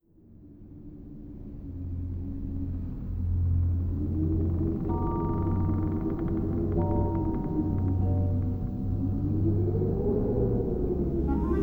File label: Undead (+ Voices & Ambience); Conker: Live and Reloaded